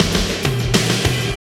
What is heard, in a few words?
Fills, Roland; Sound & Vision - Gigapack I; Perc